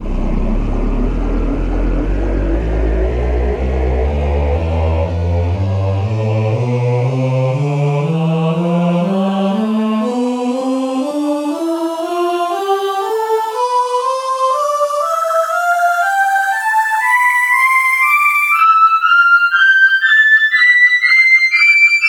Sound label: echo drops tonleiter